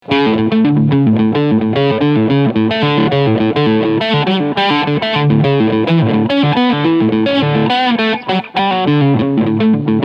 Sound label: FGN Neo Classic LC20 Crunch Sound Steg Humbucker